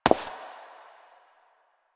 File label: Step; Warframe; Koumei